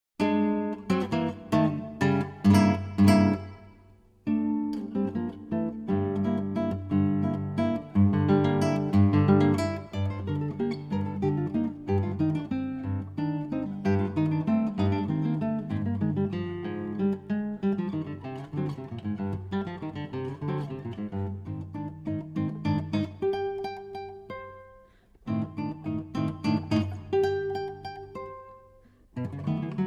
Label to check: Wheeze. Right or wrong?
Wrong